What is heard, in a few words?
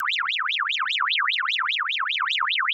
warning